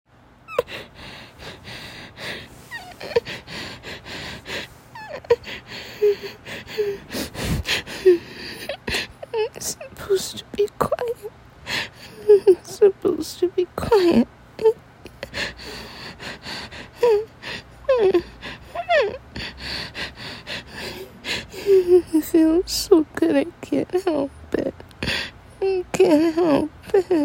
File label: Desperate puppy noises, whimpering, incoherent; fsub; F4A